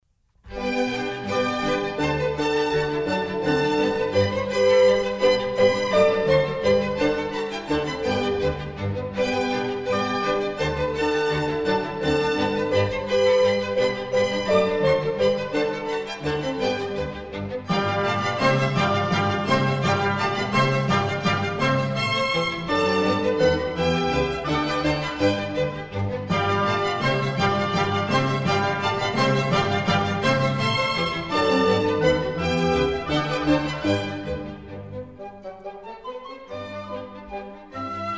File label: German Dances, G Major; Mozart; Dances and Marches